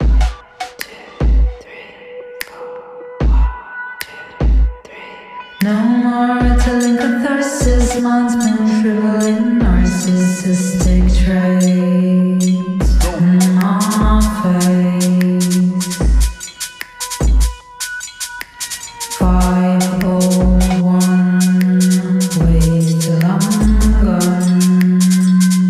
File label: beat